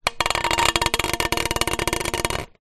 small metal pole scrape on cobbled concrete ground; foley